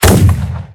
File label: cannon